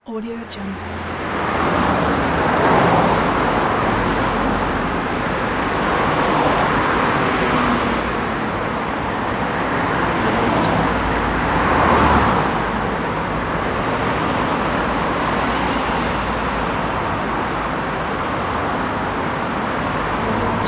traffic city

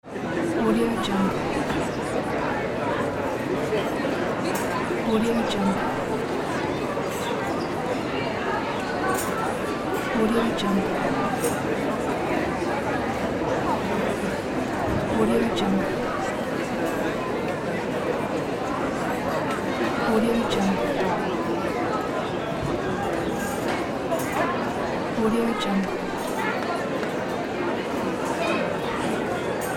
city street cafe ambience

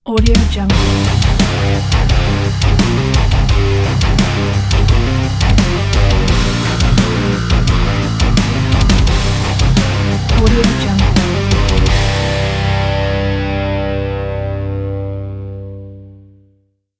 powerful metal logo

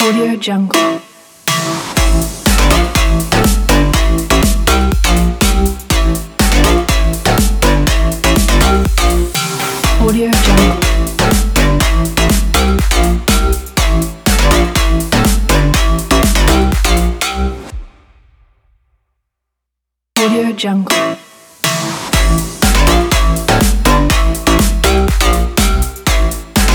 future house logo

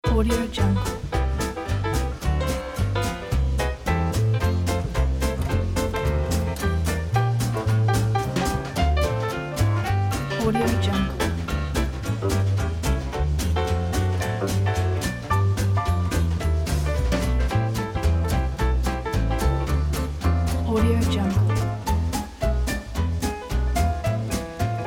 jazz and swing upbeat

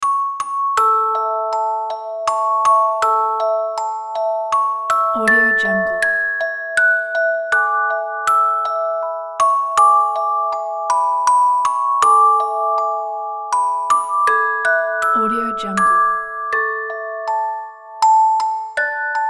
lullaby; brahms

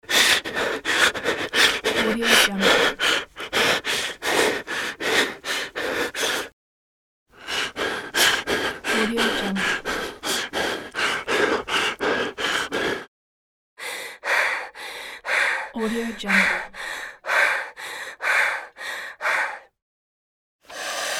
scared breathing sound effects

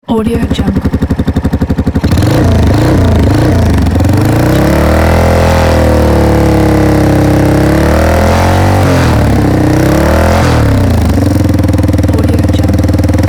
exhaust motorcycle tube

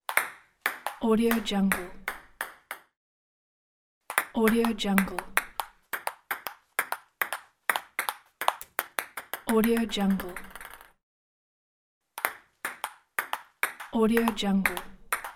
sound ping pong games

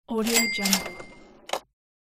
cash register; gungomer

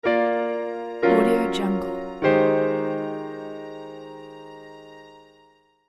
contemplative piano chords

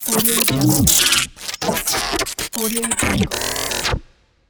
glitch madness

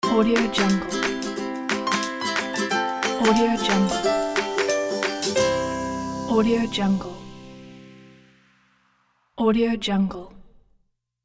happy ukulele logo

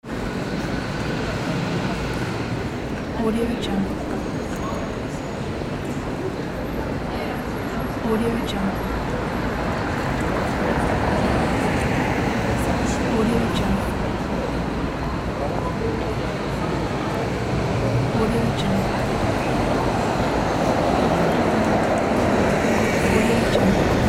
traffic noise

